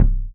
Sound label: old school good kick drum sample C key, kick drum one shot